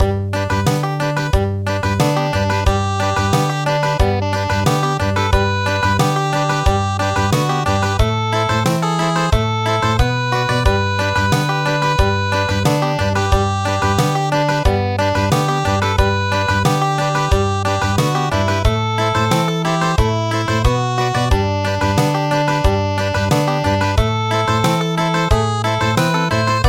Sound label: Country